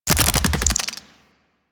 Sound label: Infested Big Ashen Melee Start; Warframe